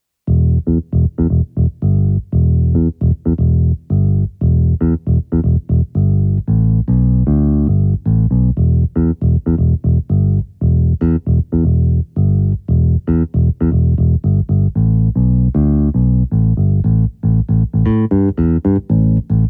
finger bs

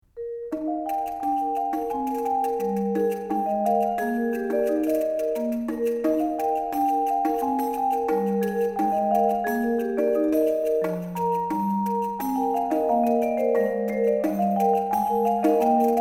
percussion

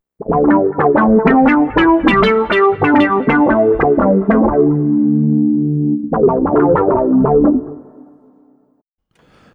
v bass synth bass

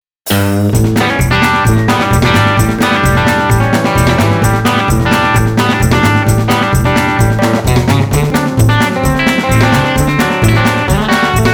patch demo

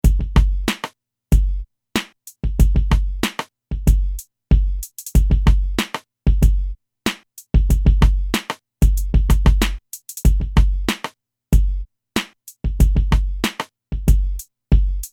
beat

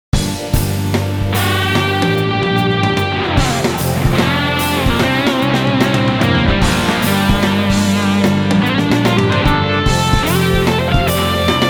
fuzz echo

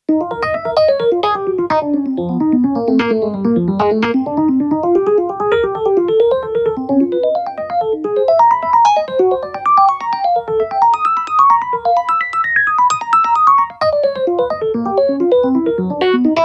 vr-09 stone ep

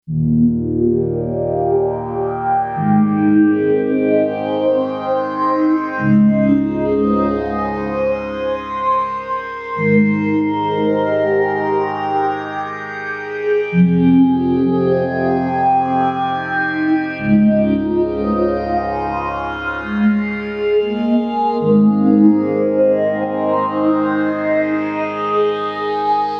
sweep up

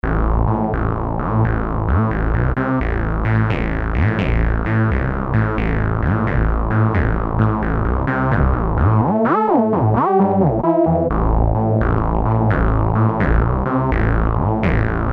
nu saw bass; fantom xa

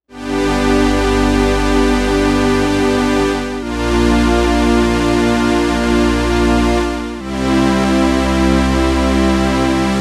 Hi Strings

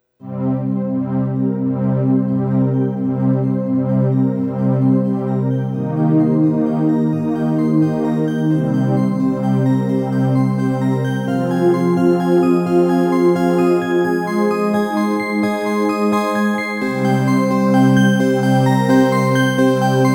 sh-01 bank 1 patch 6

